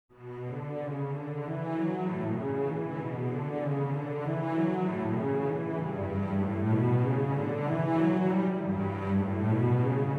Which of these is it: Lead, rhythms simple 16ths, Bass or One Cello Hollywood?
One Cello Hollywood